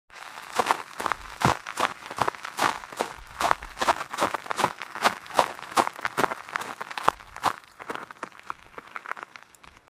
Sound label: jeep driving on gravel; offroad